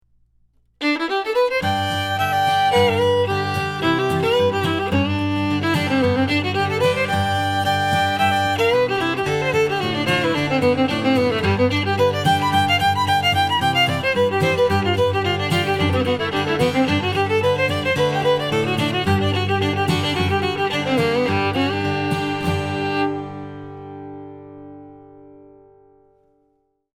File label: Wandering Boy fast